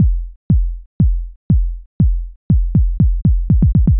sim kick; hydrogen

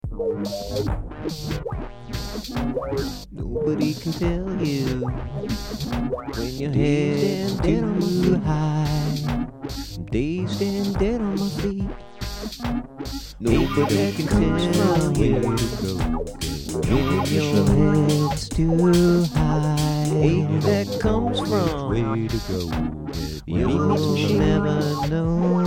wack bass get down and low

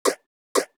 Clap Hard; hydrogen

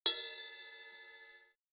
Paiste Bell; hydrogen

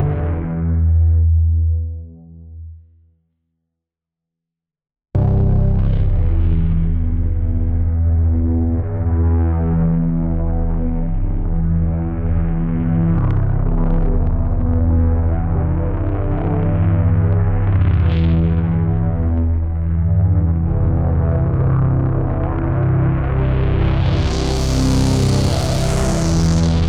industrial synthpop; ComfyUI; audiogen

